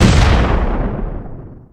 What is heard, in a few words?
balls destroyed; 祖玛